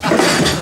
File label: Toolkit; Zero-G; Total Drum Bass; Hits and Bits